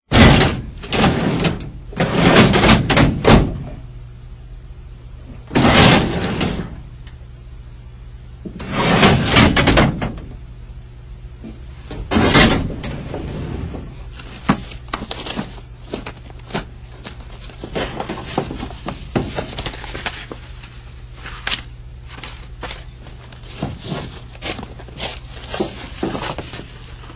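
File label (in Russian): открывание хранилища сейфа закрытие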